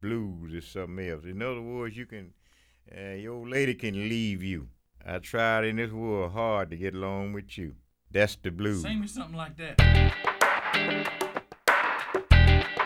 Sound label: BLUES REMIX, Voices; Spectrasonics; Symphony of Voices